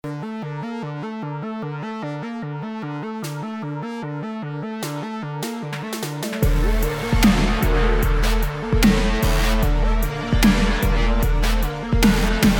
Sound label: electronically orchestral